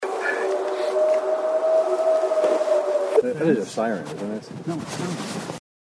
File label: Howl; bigfoot; Bachelors Grove